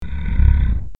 silent beast growl

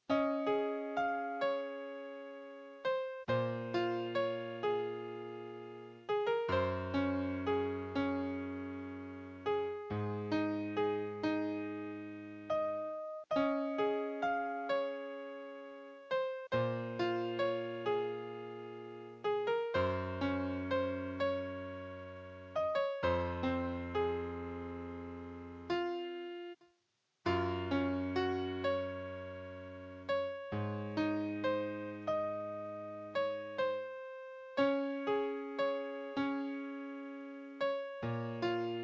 solo melody piano

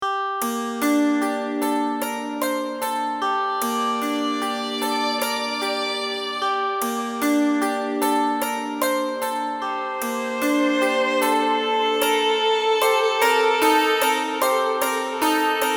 Fantasy Music - The Mourning Forest

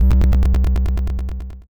seal pup

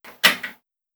Cabinet Lock Sfx

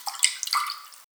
swim

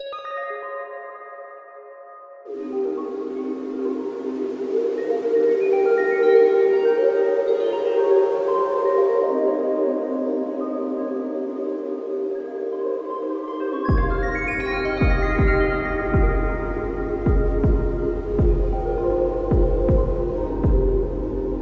forest

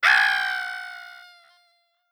Minion Die Cliff

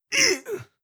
damage grunt male

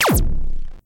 abilty paralyzing bolt

